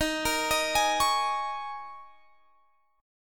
D sharp 7th Suspended 4th, Guitar, Drop D

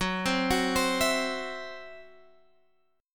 C-Major Flat 5th Gb, guitar

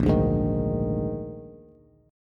Bb 11th, Bass; Bb11 strummed; JGuitar

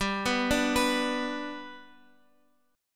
G Major down Guitar Open F; G arpeggiated; JGuitar

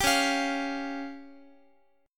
C sharp Major Flat 5th, Guitar; C#Mb5; strummed

